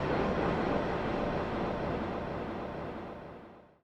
ethno 125; eJay

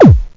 tr808 blib; Zoom - Release 2 (1996); Active Software; synths

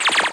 Positron beam; Starbound II

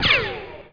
ball; Pong

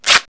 quick rip; Wired for Sound Pro CD; Aristosoft, Inc.; rip tear

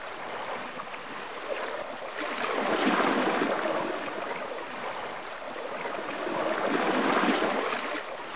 waves; island

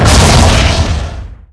BLAST; baddys; tflegion

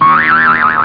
DOIIING; Spanish Net; CAFE

